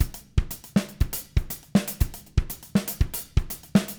120, Living Drums, CARIBE

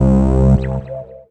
Bass Synth; Sonic Foundry (Sony Creative Software) - Stylus Pressure Urban Grooves on Digital Wax; G Forces

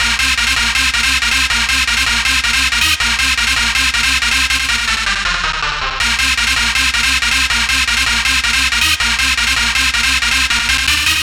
Dance Synths, Hardcore Loops, Synth Loops

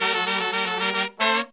Pop & Funk Brass

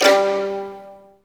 STR, Roland LA Composer; STR ORC FX; Roland - LA Composer vol. 1

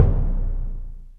Concert BD; Roland M-OC1

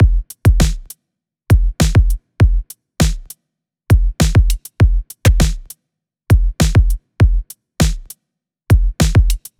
EDM Drum Loop 100 BPM; Dance; 99Sounds Music Loops; 99Sounds x Monosounds

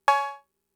Cowbell; Fricke MFB-522; COWBELLS

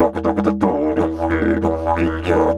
Didge 93 BPM, Didgeridoo

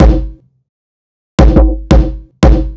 Kick, Kick Loops

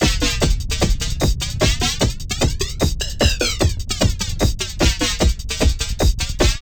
FLANGER, Tekkno LOOPS 150; Masterbits - Tekkno Trax

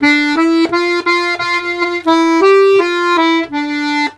Accordions; USB Soundscan vol.40; AKAI

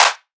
drum clap plastic; Virtual Riot; Drum Hits

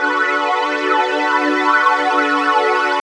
B, Drum & Bass Pads; Total Drum&Bass; 90 s Sample CDs

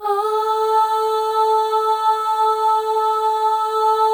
AHS, FEM; Spectrasonics; FEM.2 AHS

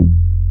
bass Croma F 2; Best Service Gigapack 2

